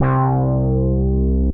Houdini Syn Bass; Synth Bass; Ableton; Factory Packs; Beat Tools

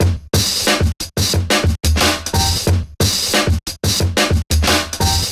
Kit Loose Foot; Drums; Ableton; Breakbeats by KutMasta Kurt

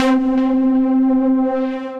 Indigo Stab Pad, Synth Lead

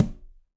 Tumba A Heel, Latin Percussion; Drums; Drum Hits; Conga; Ableton; Factory Packs

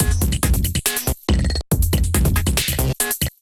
Preon Kit groove 140 bpm; MIDI Clips; 133 - 151 bpm; Ableton; Glitch and Wash

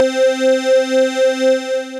Non-Jazz Chime Synth; Instruments; Mallets; Ableton; Synth Essentials; Operator

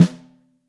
Top, Snare

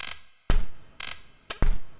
IDM Perc, IDM Percussion

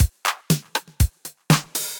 Kit-707, Effected Drum Kits; Drum Machines; Ableton; Factory Packs

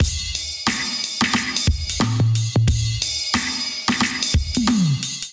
Wild 'n' Free Kit 90 bpm, Drums; MIDI Clips; Drive and Glow; Ableton; Factory Packs

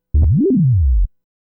062 R&B Bass Slide C#; R&B Funk Soul

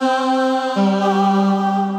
Voice Box Choir, Choral Voices; Ableton; Factory Packs

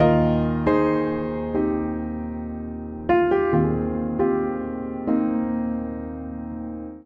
Phrase Eb Major 136 bpm, Upright Piano; MIDI Clips; Signs Of Progress; Upright Piano by Spitfire Audio; Ableton; Factory Packs

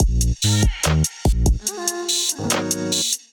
Keys 2 Love Kit groove 144bpm; MIDI Clips; Ableton; Factory Packs; Beat Tools

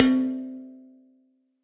Steel Tones, fleamarket percussion; Instruments; Ableton; Factory Packs; Lost and Found

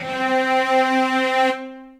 Cello Section, Orchestral Strings; Ableton

